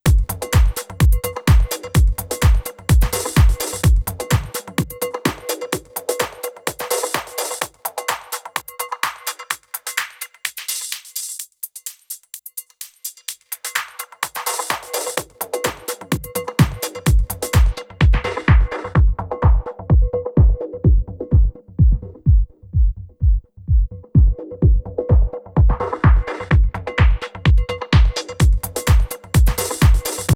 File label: Filter